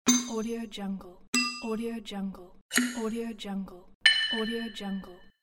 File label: mystic button